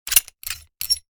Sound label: Silicone sealant gun repeated trigger presses; hand tool